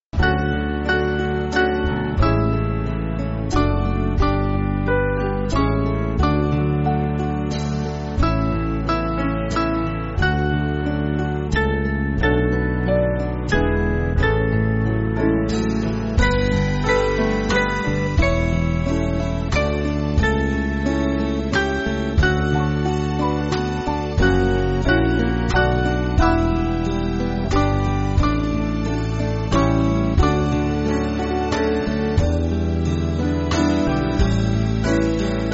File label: Lord I Was Blind, band; Saxby